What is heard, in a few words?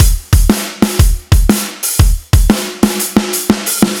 Tri Beat B